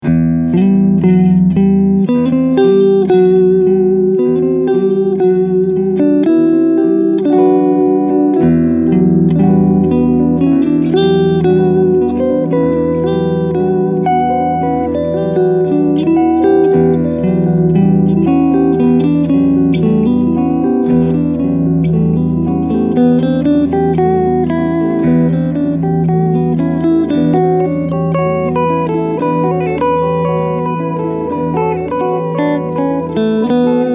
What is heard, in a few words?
EP Long Delay LP Filter